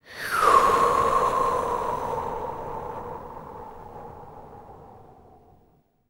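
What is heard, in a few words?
female breath; Spectrasonics; Vocal Planet; Jazz FX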